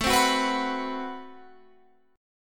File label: A Minor 6th Add 9th A Guitar Open F; 21,19,18,18,21,18; strummed; Am6add9